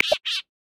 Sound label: Galapagos Mockingbird; Xiaomi Redmi-Note-8-Pro